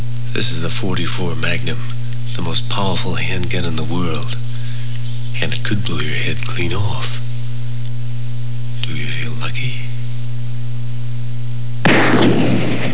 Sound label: 44 magnum